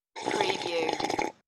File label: Long Sip